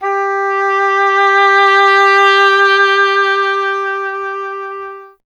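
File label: oboe, wind; Miroslav Vitous - Solo Instruments VOL-2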